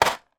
industrial plastic box of small screws drop on table